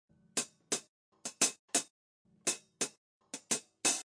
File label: hat mic, Drums